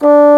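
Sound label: Bassoon, D2; Digital Sound Factory Virtuoso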